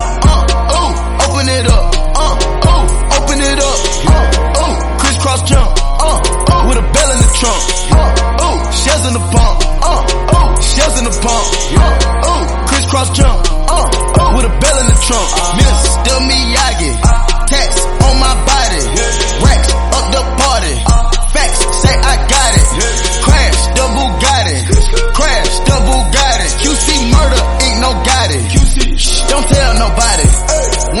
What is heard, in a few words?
english rap song